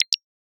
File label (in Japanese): メッセージ